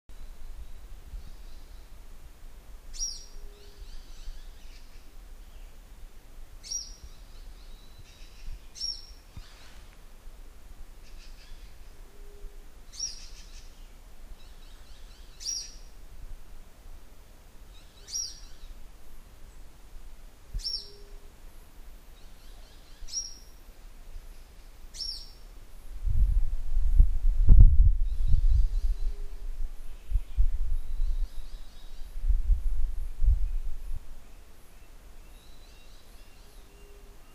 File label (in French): Oiseaux